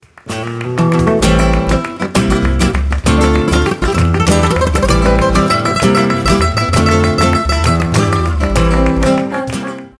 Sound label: Flamenblues